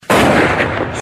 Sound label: Gunshot; Django